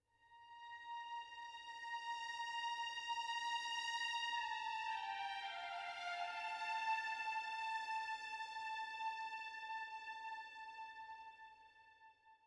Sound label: Quite noble festivities